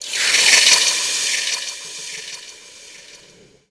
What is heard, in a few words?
HOT METAL; ENVIRN-AMB